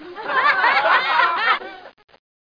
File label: laughter; humanos